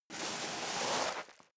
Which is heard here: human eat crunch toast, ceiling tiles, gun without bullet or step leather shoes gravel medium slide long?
step leather shoes gravel medium slide long